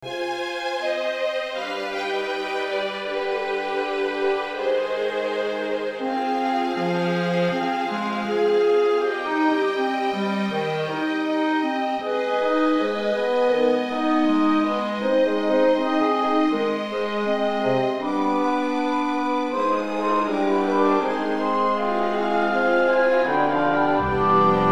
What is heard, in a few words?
Cello Concerto